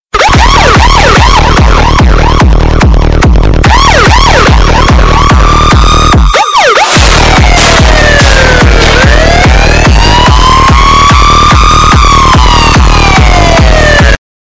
Funny Alarm Remix Song

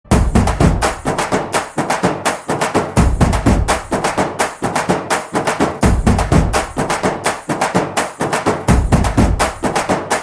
Drum Beats, Telugu Ringtone

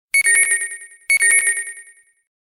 Echoalert Ringtone

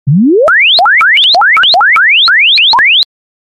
Loudest Ringtone

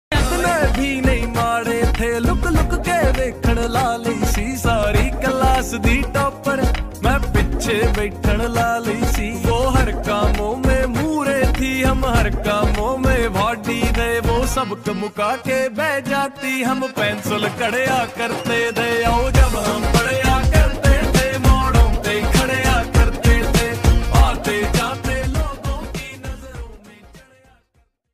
DJ Punjabi Ringtone

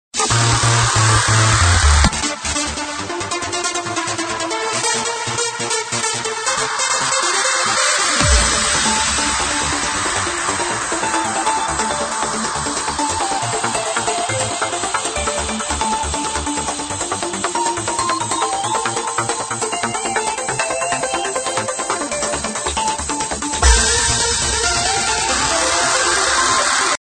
Trance Ringtone

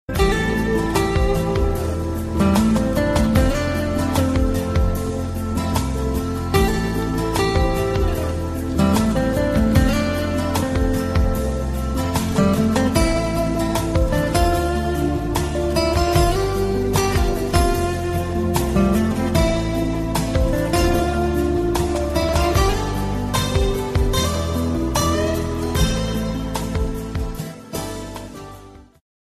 Guitar Ringtone

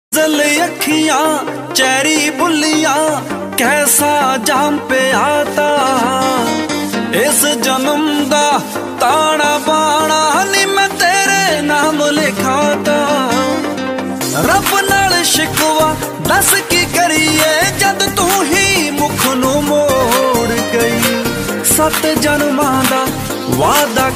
Popular Punjabi Ringtone

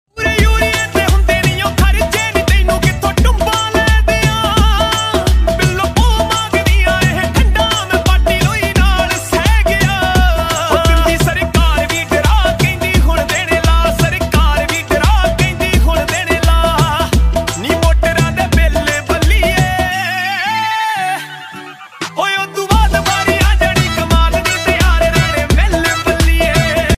Punjabi Tune Ringtone

